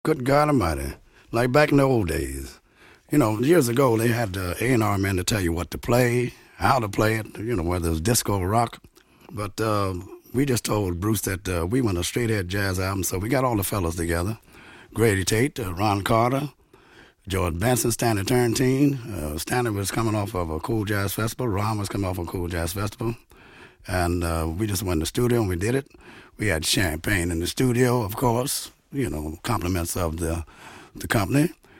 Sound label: rap; Jazz